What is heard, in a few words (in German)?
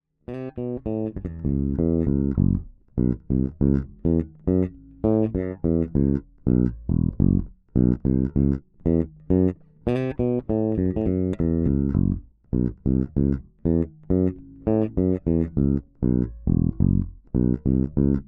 Sadowksy NYC Modern Both Pickups passiv